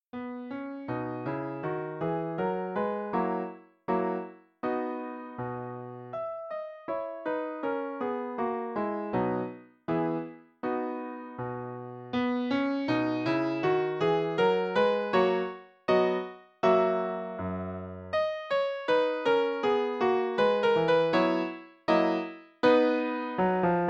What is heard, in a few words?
Gavotte